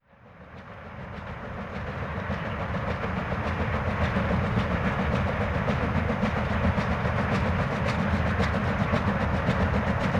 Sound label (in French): trains a vapeur a voie etroite; sonotheque